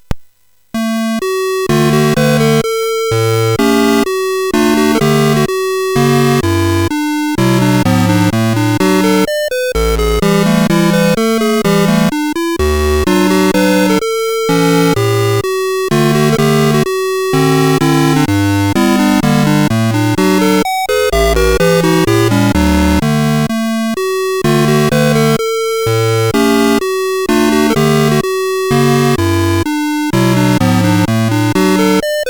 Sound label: Valse, MOS6581